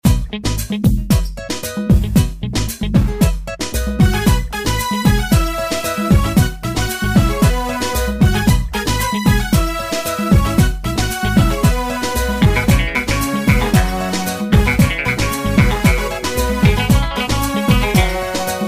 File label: Somali music